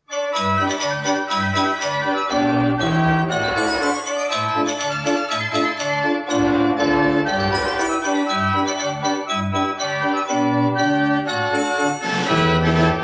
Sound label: Polka